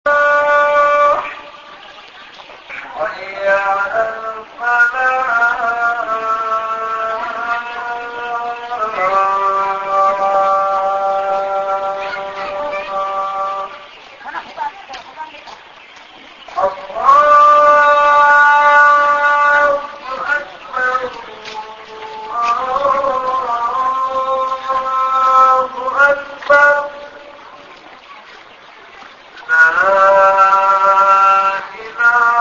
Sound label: azan, chitral